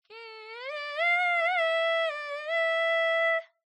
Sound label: Kulning